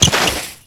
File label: brake, glass; gameplay; materials